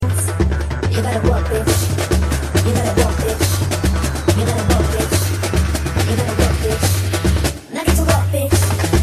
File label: samba